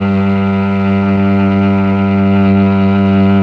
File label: MEL CEL E G 3, mello cello